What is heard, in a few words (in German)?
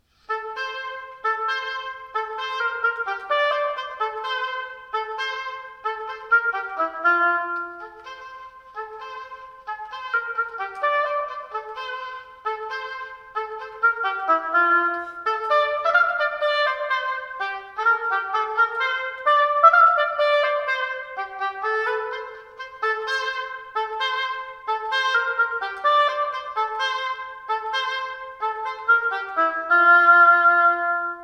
Oboe; Pumuckl; Musikkapelle Sankt Johann im Walde